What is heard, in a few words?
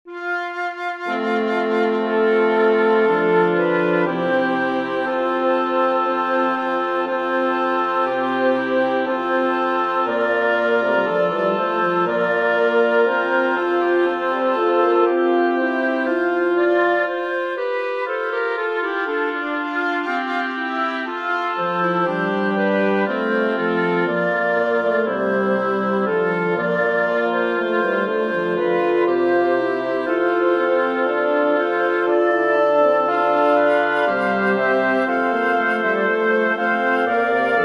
tantum ergo sacramentum for woodwind quintet; victoria tomas luis